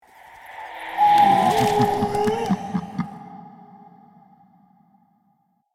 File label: Halloween spooky whoosh transition